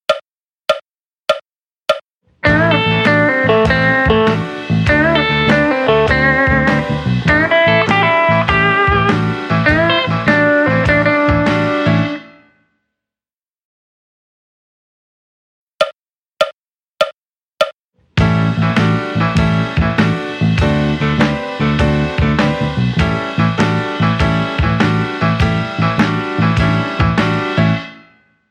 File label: RnB Guitar